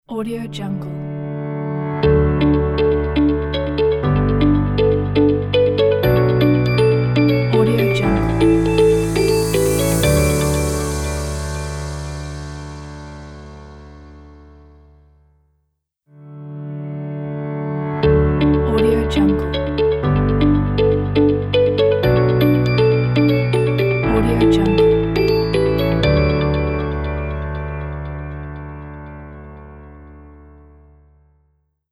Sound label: logo corporate